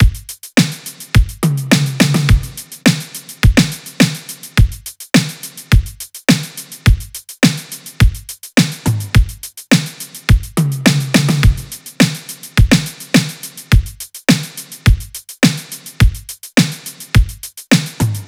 drums 105, Drum Loops, Full Drums; National; Slate; MIDNIGHT Sample Pack

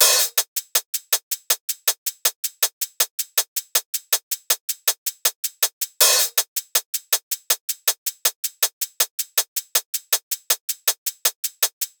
hat loop 80, Drums; Pop; Hat Loops; Slate; SD PASADENA Pop Sample Pack

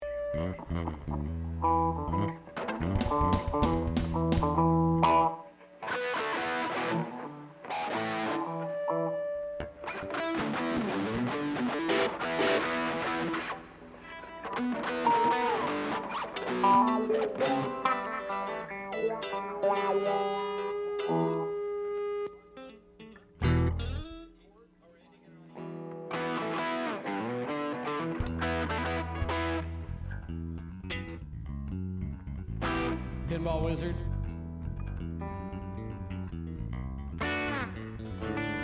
Strings Mix

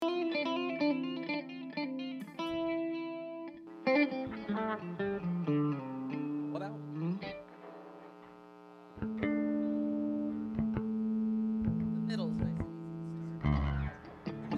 Louder Mix

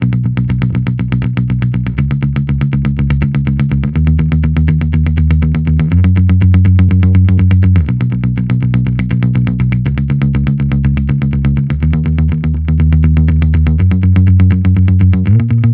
Amped loop 122 F#, Electric Bass Loops; Slate Digital BASSHOLE Sample Pack

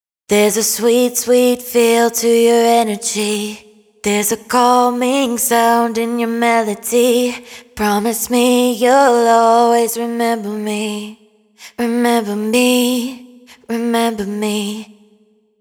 hook Energy 128 Bm wet, Vocal; Slate Digital Omnivox Vocal Sample Pack

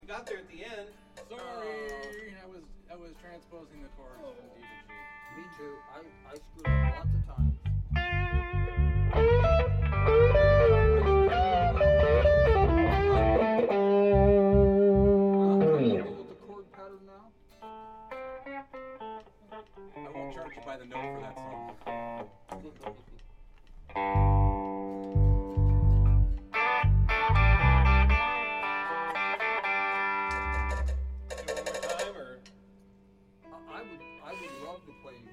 Room Mic

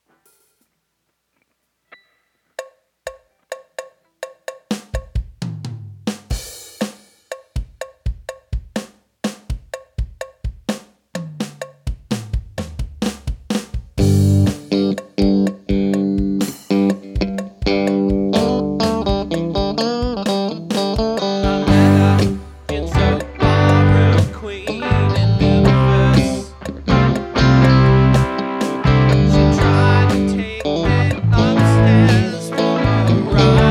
Strings; Cowbells

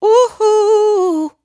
"Woo Hoo", adlib, Dry Vocals; Raw Vocals; Slate Digital Omnivox Vocal Sample Pack; Adlibs

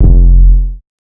808 one shot F

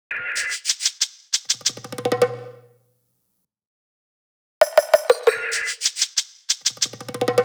perc loop 93, Pop, Drums; Loops; Slate; SD PASADENA Pop Sample Pack

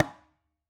conga Single Hit Slap; Raw Perc One Shots; Slate; SD SEISMIC Drum Sample Pack

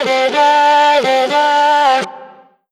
phrase Dm, Vocal

